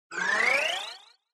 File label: Scene Change Long Stereo Pan